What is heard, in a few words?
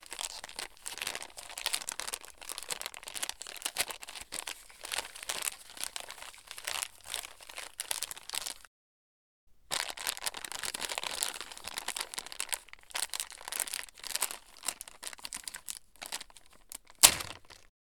Plastic Package Crumble Open